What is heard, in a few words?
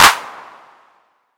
Clap TR-808 Drive; 808 Day